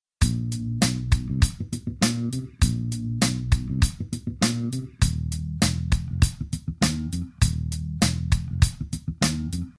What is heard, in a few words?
Instrumental